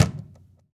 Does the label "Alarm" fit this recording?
No